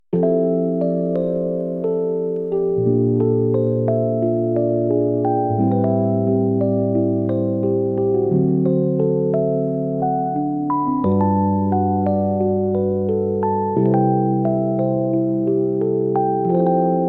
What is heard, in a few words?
tune, chiptune